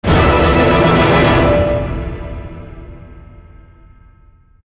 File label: Action End; Bt London Westminster; Call of Duty Modern Warfare 3